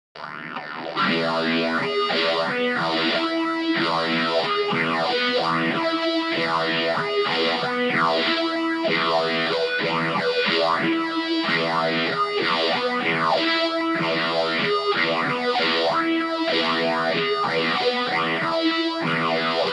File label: harmonics phaser